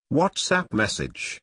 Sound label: whatsapp message